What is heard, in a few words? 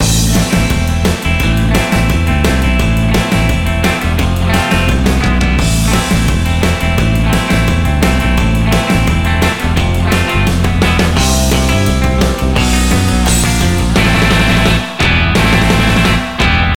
Music Surf; Learn to Fly 3